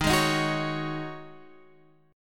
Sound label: C sharp Suspended 2nd Suspended 4th D sharp, chord